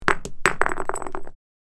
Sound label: Grenade roll on hard surface